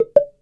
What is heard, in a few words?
Indian Cow Bell; ringtones